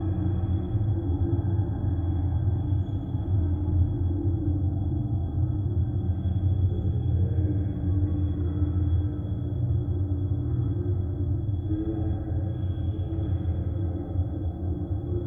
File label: palatial room doors fumes loop; Grim Legends 2: Song of the Dark Swan